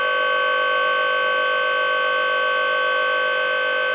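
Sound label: minigun spin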